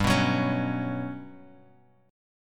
G Major Sharp 11th G, Bass Standard; GM#11 strummed

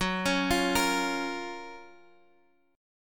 Gb Major 7th, down, Guitar, Open G

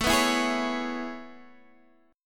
C sharp Minor 7th A; C#m7

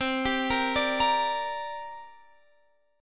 C 7th Suspended 2nd, C, down; C7sus2 arpeggiated

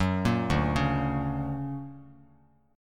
D Augmented Major 7th down Bass Standard; D+M7 arpeggiated; JGuitar

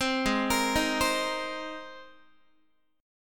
G sharp Major Add 9th, Guitar; arpeggiated; 15,16,13,13,16,x; 4A,4E,5A,5D,5G#,6C#; G#add9

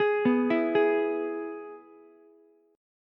G sharp Minor Sharp 5th, Ukulele; G#m#5 arpeggiated; JGuitar

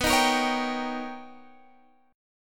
B Augmented Major 9th B Guitar DADGAD

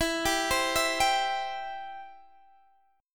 E-Minor Sharp 5th, Guitar, Open D; x,19,17,18,19,17

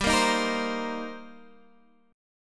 D sharp 6th, G

